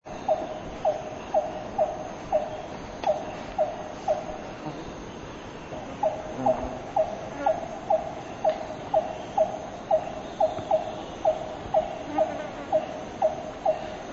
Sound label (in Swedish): lambir natur; borneo